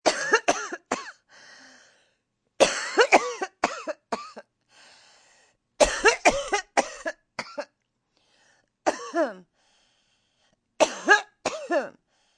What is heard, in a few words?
Cough Harsh, Cough, female, sick, cold, dry smoker's cough, long (human voice); Sound Effects:Human; Voice Box